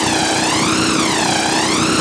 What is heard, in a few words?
tracer tow, guns tracer; World of Tanks Blitz